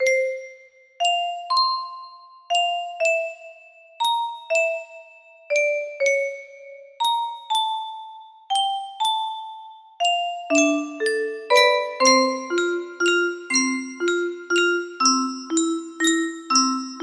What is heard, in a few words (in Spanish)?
vals de cajita musical